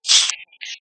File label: kill, silverfish; mob; Minecraft